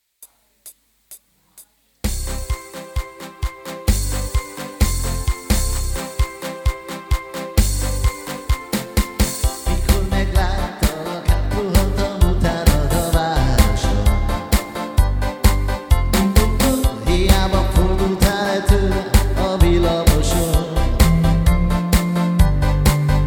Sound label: Bossanova; tanc